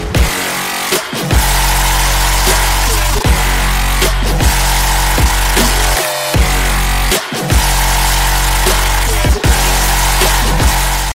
iphone x remix ringtone

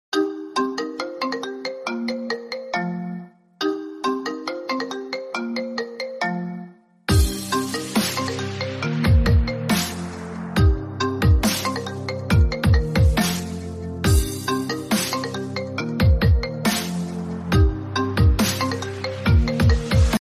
iphone 6 plus remix tone ringtone

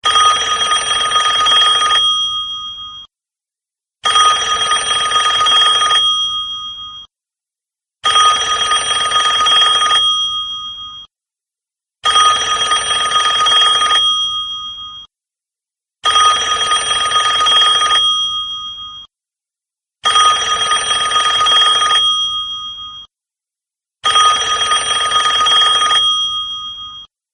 iphone 3g bell ring ringtone

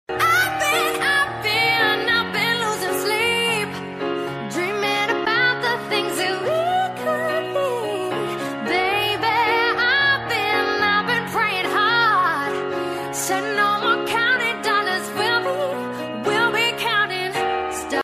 huawei remix ringtone

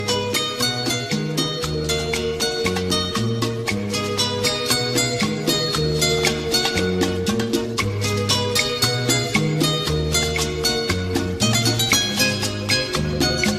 classic guitar i phone 6 plus ringtone

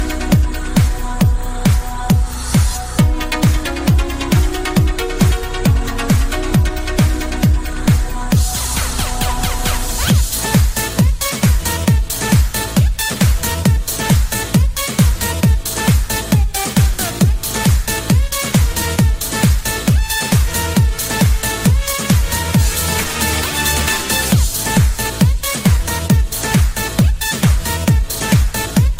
dance remix ringtone

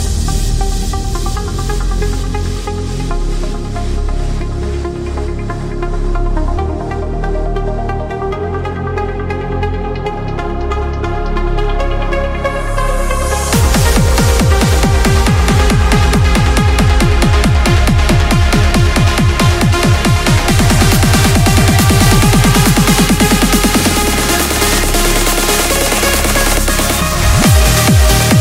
uplift iphone x trance mixs ringtone

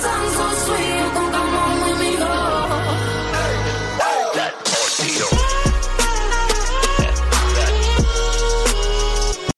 remix ringtone; i phone 4s

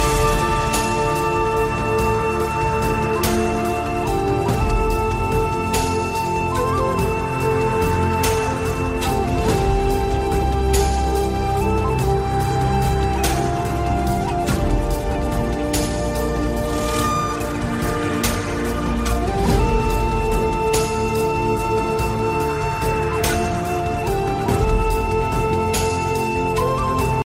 piano ringtone; i phone; x plus; ecuador

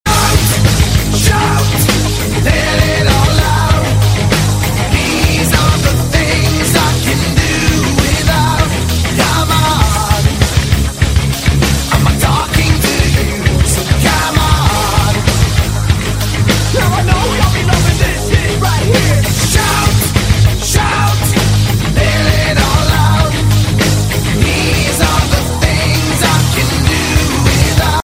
iphone x remix ringtone

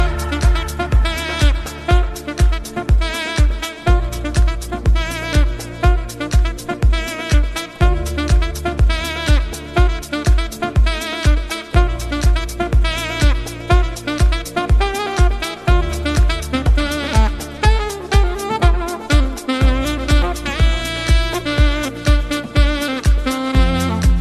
saxo phone iphone se new mix ringtone

